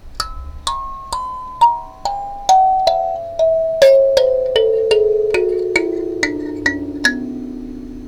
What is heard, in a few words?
kalimba